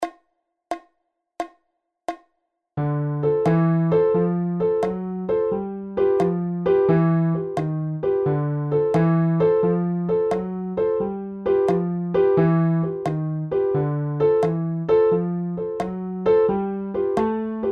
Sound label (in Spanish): Acompanamiento Swing